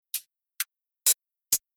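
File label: Rhythm DubStep hi-hat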